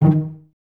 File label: CES D#3 Pizz, Cellos, String Ensembles; Miroslav Vitous